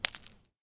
Shell 39mm gravel; STALKER; IWP

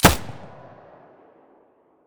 muzzle; S.T.A.L.K.E.R.; IWP; vityaz; pp1901

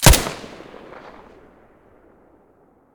silencer mono, weapons

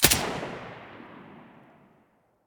silencer mono

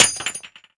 shell heavy metal; STALKER; IWP

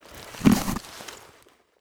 pistol mask use; STALKER; IWP; item usage

